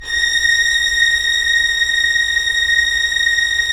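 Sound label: Strings Violins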